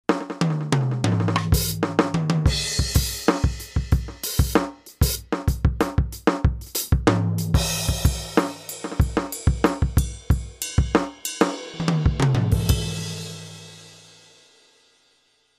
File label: kit